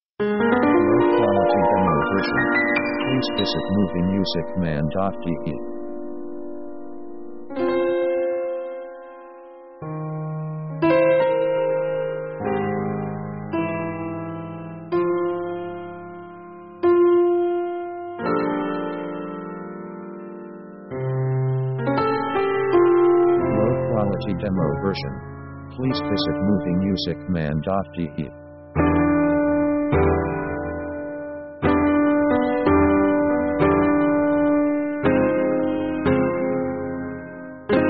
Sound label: orchestra american